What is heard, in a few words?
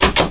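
chest large; yoda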